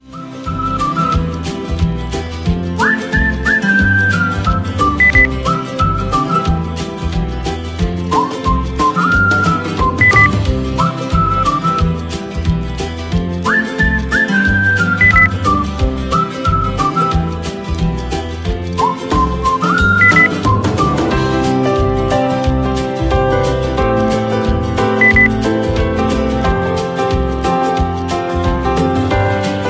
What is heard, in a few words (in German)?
gute laune pure lebensfreude beep